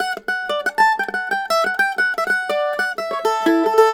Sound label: MANDOL 120 D; USB Soundscan vol.42; Celtic Flavours; AKAI